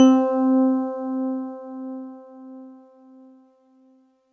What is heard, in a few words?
Kawai PHm Real Rodes C4